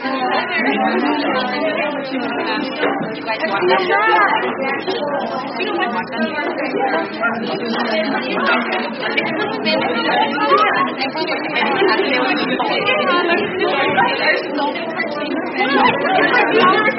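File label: Ambience Female Babble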